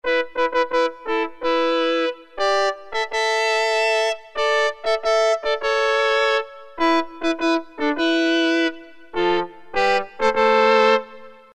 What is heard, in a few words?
Trumpets, Roland Alpha Juno-2; Factory B